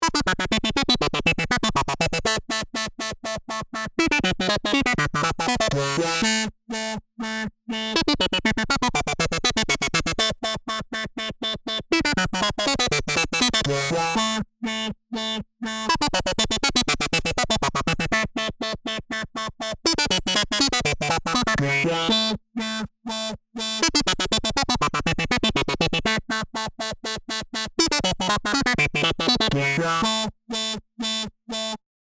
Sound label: Nokia basic